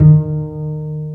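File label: String, contrabass pizzicato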